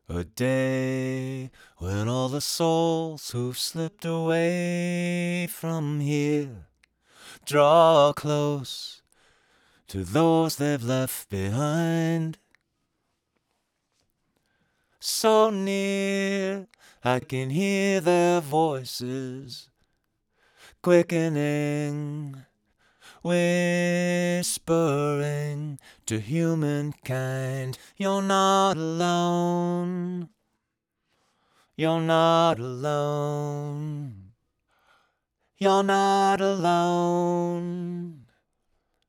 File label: pitch down 1 third; retune